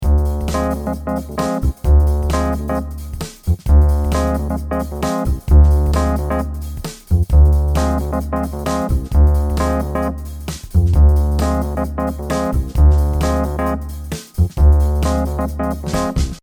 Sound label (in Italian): solo base